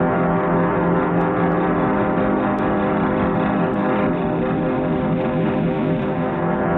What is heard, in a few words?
texture lumbago grains looped; Polyend; Recue